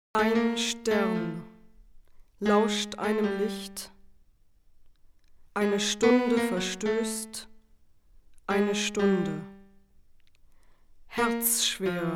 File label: piano; Celan